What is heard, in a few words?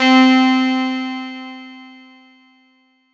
Kawai K1 II Reflection C4; Synthesizer - Keyboards